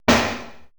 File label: drum e4, drum computer, 80s drum set; Best Service ProSamples vol.55; Retro Sampler